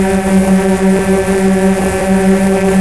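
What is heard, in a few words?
fast buzz loop, ships engine; Quantum Redshift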